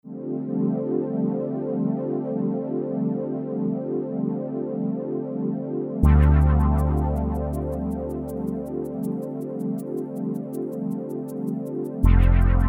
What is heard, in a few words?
Pad filtered